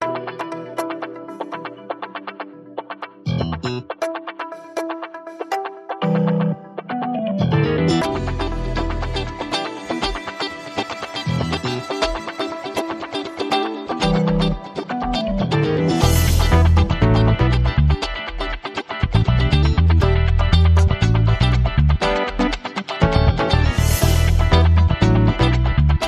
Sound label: Funk, No Perc